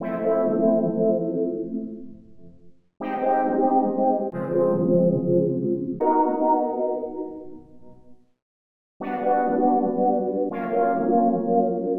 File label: Synt; SYNTH; 80 BPM